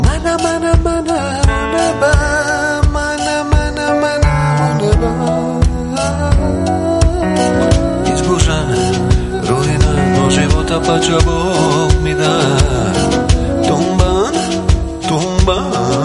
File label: Piano